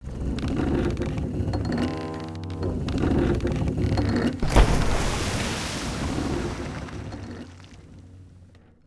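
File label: Work Boat Ancient Work; Civ4 warlords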